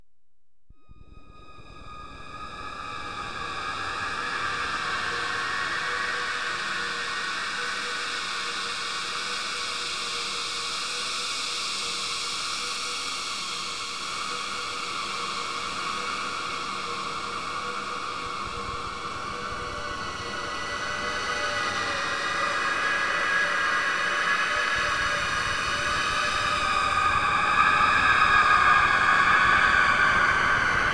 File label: Ambience